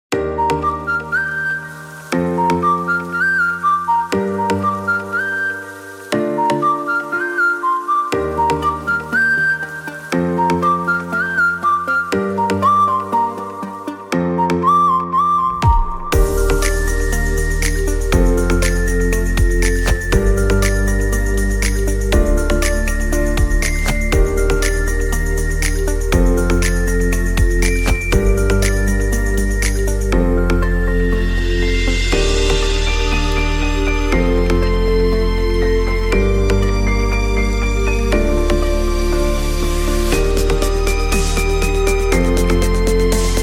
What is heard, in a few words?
Rain